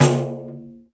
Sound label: Pandeiro; Roland BK-5; Percussion